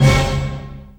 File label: ORCH HIT LO; Back In Time Records; Big Bang; THE HIP